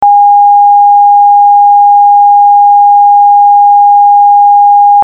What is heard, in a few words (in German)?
Sinus 816 Hz 8 Bit